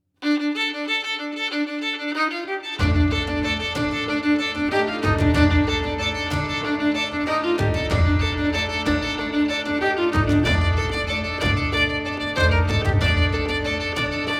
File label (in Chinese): 高级感氛围, 宝藏背景音乐